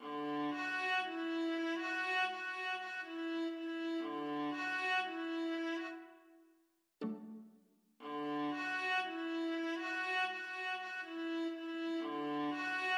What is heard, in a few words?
black pepper samba, viola